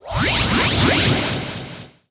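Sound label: Summon Synchro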